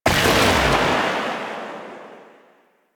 Shot Alt, Rifle; Weapons; Warframe; Thanotech; Trumna Prime

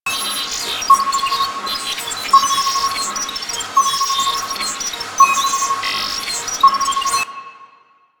Jammer Loop; Warframe; Nineteeen Ninety Nine; PvPvE

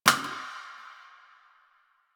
Janitor Plastic Spray Bottle

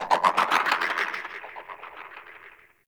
Ext Dist Animal, Ambience

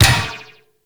Ball Bounce Metal; Warframe; Missions; Tenno Ball

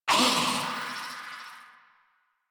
Pain, Barks, Battle; Warframe; Zealoid Prelate

